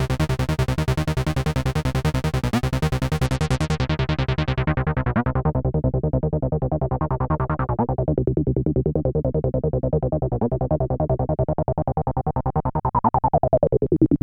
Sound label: MC202 Bass Loops